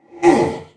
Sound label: Wound Crit; Creature; Deathbringer Saurfang